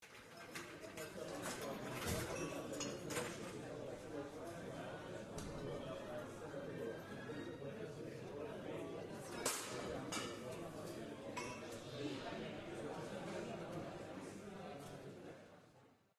cafeteria; minecraft

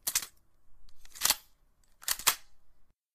waffen laden; minecraft

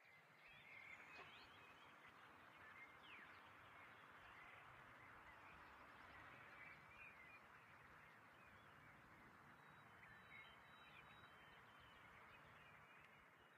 rsound voegel; minecraft

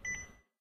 kopierer; minecraft

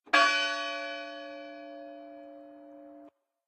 kirche glocke; minecraft

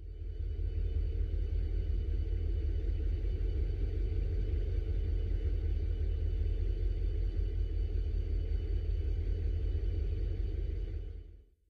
sound zug; minecraft

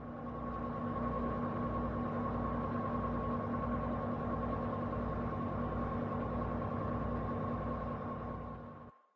bus; minecraft

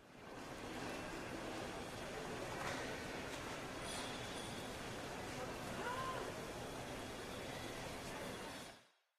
flughafen innen; minecraft

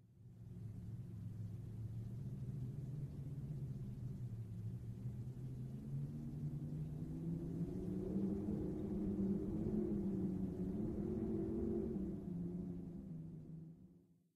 durchzug; minecraft

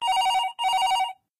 telefon klingeln; minecraft

